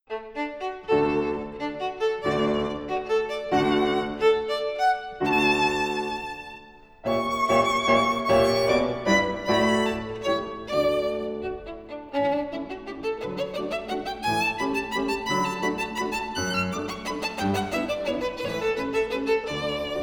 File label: Country dance; Weber